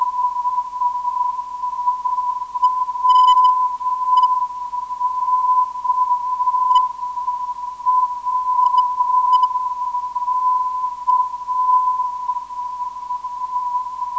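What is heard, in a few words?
WK 339kHz, non-directional beacon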